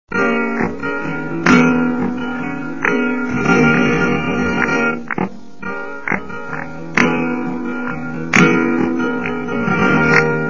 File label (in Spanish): buleria SLOW